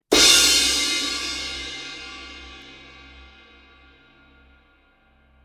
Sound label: dark crash 18 hit, cymbal; sabian